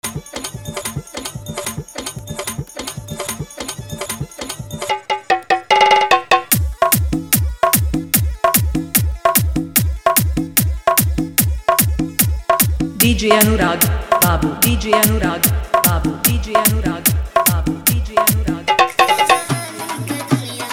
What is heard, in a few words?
Hard Bass Mix